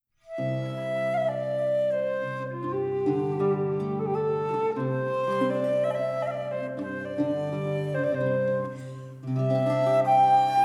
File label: flute; doun in yon bank; skene ms; c 1630